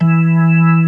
HAM E3 -L, KEYBORD

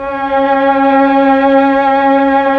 STR, Melo. Violins, Tron Violins; Roland LCDP09 Keys of the 60s and 70s 1

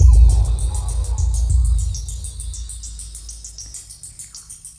Ambi Groove

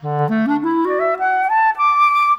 Winds, Orchestral, Rock Pop

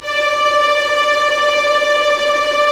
STR SEC, Roland LA Composer STR ORC FX; Roland - LA Composer vol. 1

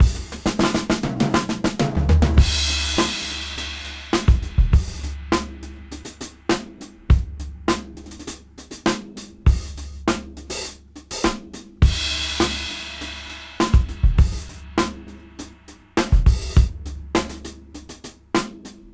Old School 101 BPM

